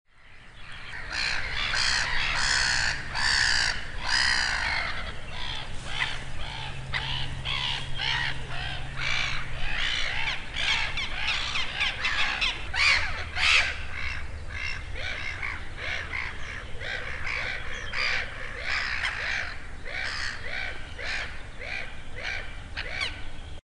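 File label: black-headed gull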